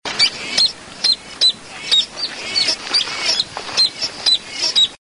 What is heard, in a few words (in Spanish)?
espinero pecho manchado